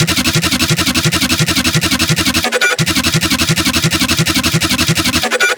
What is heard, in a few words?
Synth loop